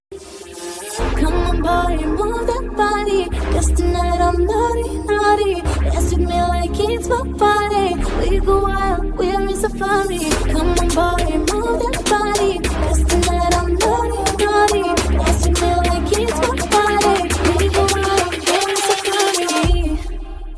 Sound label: ringtone